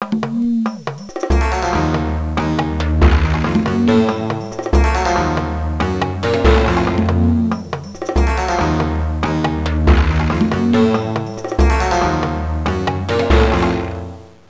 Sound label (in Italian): musica traditional est; fareast